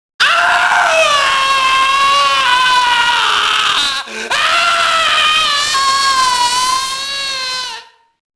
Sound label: junkie noise; enemy; Max Payne